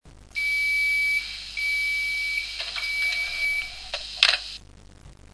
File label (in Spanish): puerta, Locomotoras; SONIDOS ADECUADOS PARA LOCOMOTORAS; CAMELLO